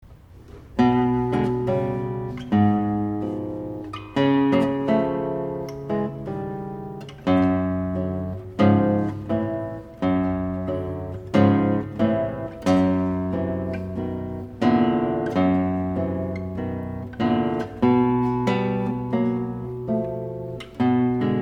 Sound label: Classical Guitar